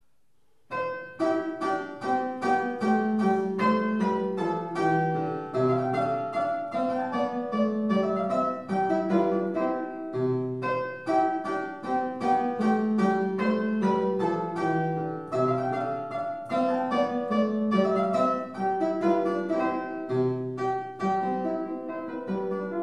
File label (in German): Hammerfluegel; mozart